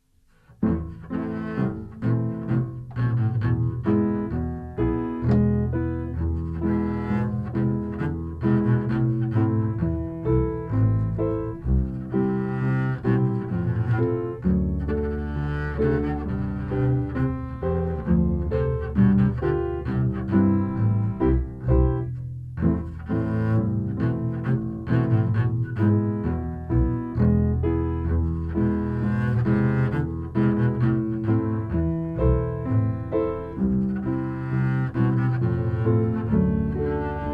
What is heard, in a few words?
Sonata Hornipipe à l'Inglese